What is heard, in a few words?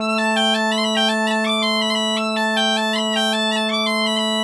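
bagpipe 90, Celtic Flavours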